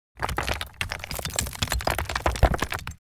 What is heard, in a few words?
Small rock rolling in dirt and debris